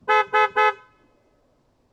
Vehicles, Horn, Car, Audi Q5, Short, Exterior, Multiple; DeltaVR; FMOD